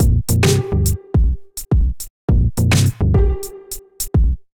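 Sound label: Three Way Kit (C minor) 105 bpm Chorus; Ableton; Factory Packs; Samplification; Hop Three Way Kit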